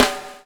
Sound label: BUBL, BUBBLE; Spectrasonics - Backbeat